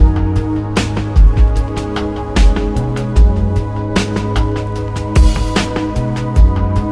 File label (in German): bass